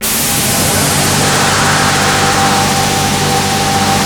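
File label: G4 noise; Northstar; The Wizard VOL-1; MYSTERY BOX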